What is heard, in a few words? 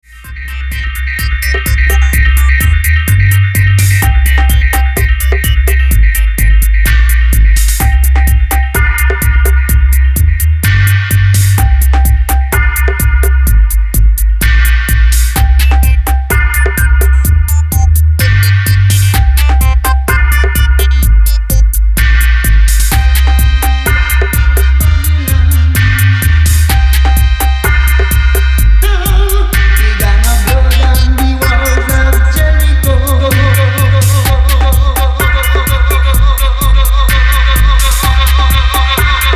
Trumpet Dub